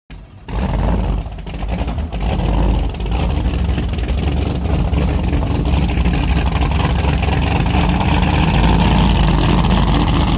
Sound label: Drag Boat Start idle